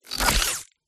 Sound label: EXOSKELETON SERVO MOVEMENT ROTATION